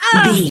voice female hurt pain

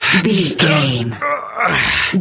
voice male effort long

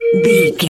whistle slide straight tone med

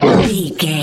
troll monster attack fast

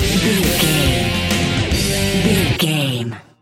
rock power stinger; Sports; Pop Rock; NFL; Football; Sports Music; Hard Rock; Metal; Driving; Bikers

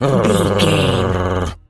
dog growl

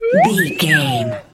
whistle slide wobble notes short

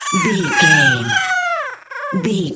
goblin fairy death

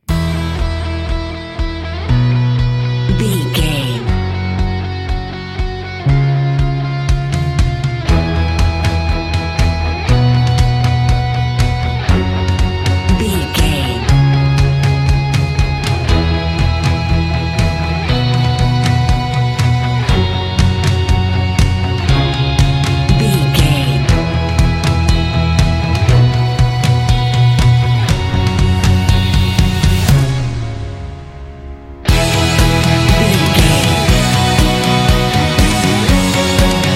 orchestral rock adventure and sports inspiration

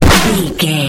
retro weapon gun misc shot

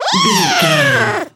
goblin fairy growl

